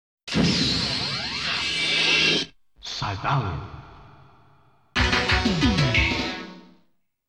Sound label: Title Coin; Legend of Game Music Premium Box; Syvalion